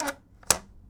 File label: Open Close, paper cutter; Micro Pack